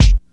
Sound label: 00s rich highs bass drum sample f key